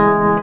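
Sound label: guit G#, guitar; BLOOD2; Music; Ancient; PC Gamer IT CD 37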